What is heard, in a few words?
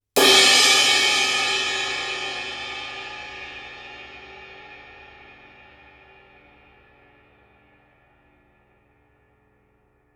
K Projection Ride Crash, cymbal; 21" Kプロジェクション・ライド; 力強く切れのあるベル; クレイグ・レイノルズとのコラボレーションでデザインされた21" Kプロジェクション・ライドは、力強く切れのあるベルと、パワフルでクラッシュ可能なボウを両立。